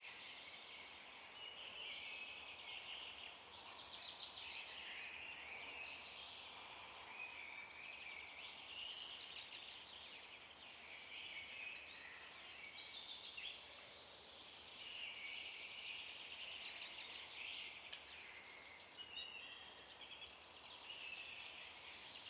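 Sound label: Baltic spring forest dawn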